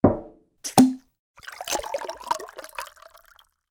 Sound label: pour drink; DeadFright